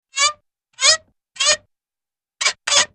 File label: cartoon squeek